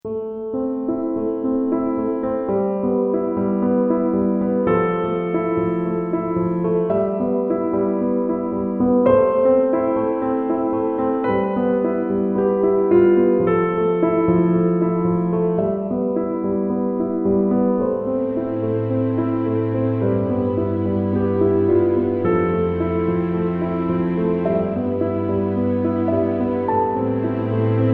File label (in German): Hausmusik